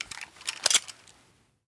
clip in; Rust

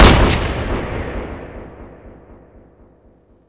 50cal 1shot

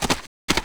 hop; Rust

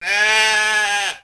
Sheep Bleat; Civ4 warlords; Resouces and Improvements

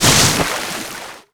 zodiac splash small; Call of Duty Modern Warfare

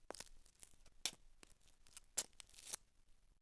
Footsteps; Civ4

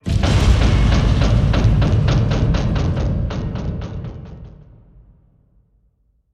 damage hits explosions; World Of Warships

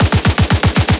loop m2, guns tanks; War Thunder

